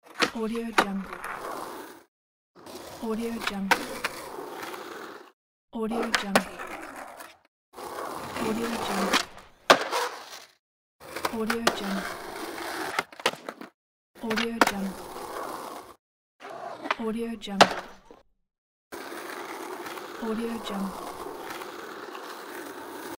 skateboard tricks and riding street; aetherfx

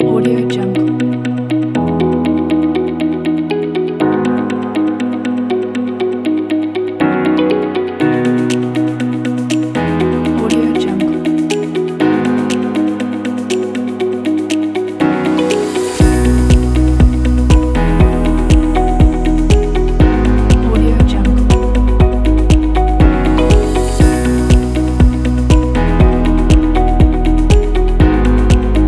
corporate motivational dynamic music

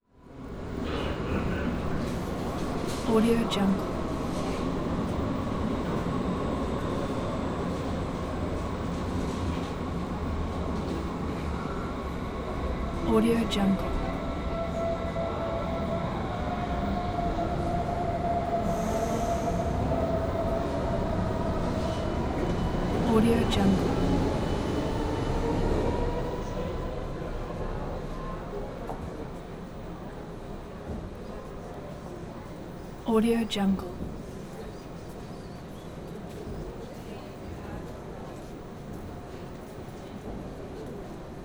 ambience underground station train arriving

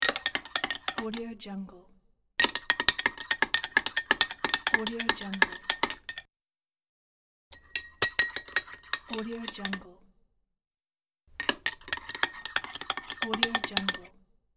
drink stir

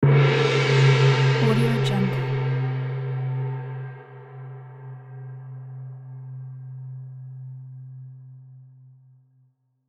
gong

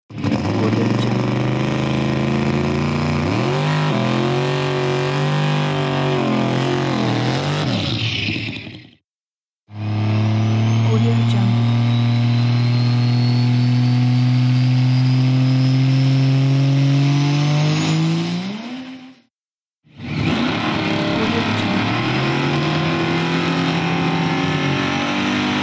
the roar of the engine

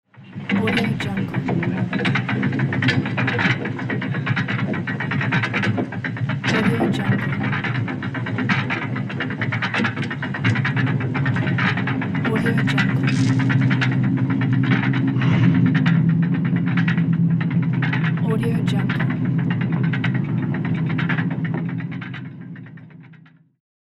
canal lock water gate opens

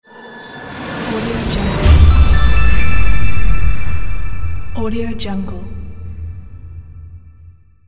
outro logo

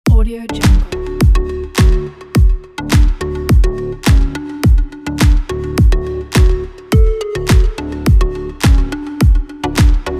house music; zizo900

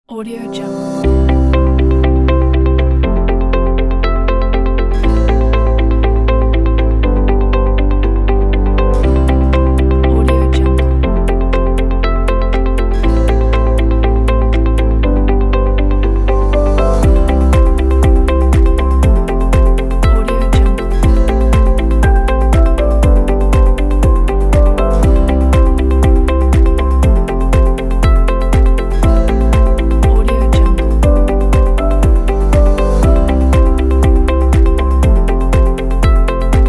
inspiring corporate

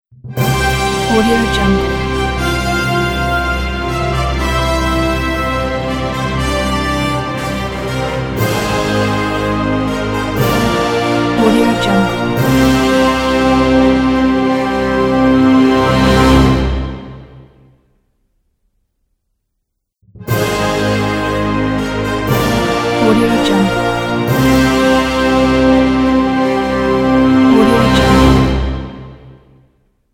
orchestral logo

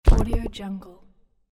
low bubble hit

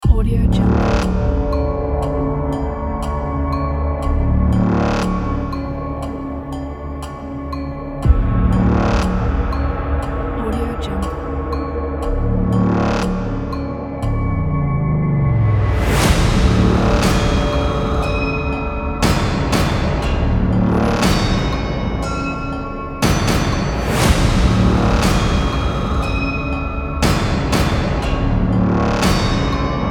intense horror sound design trailer

